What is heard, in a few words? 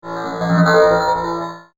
Dino Droid, robot; Robot Sound Effects